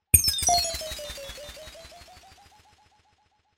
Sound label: upgrader lost